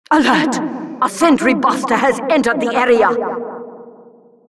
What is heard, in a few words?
sentry buster